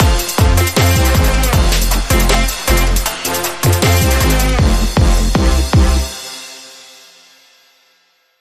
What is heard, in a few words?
Link Sting